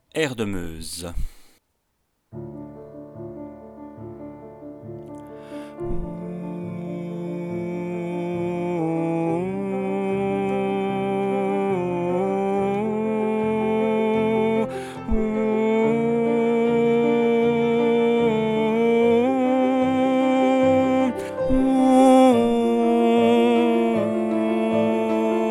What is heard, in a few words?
air de Meuse MEZ